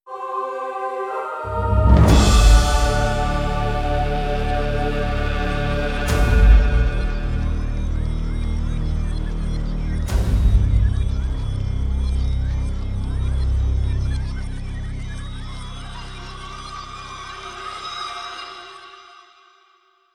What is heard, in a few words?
Round Start; Revelations; Call of Duty Zombies; Treyarch 2008